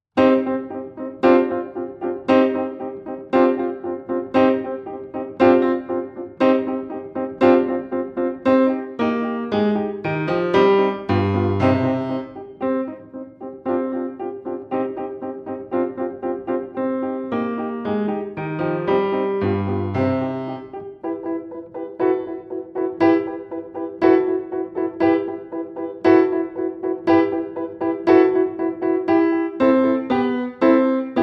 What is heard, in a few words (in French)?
instrumentale